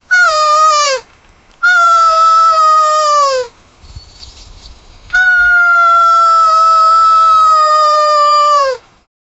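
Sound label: cartoon beetle complaining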